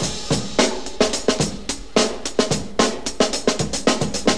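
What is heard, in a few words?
109 bpm drum loop sample d key, kick tuned to the D note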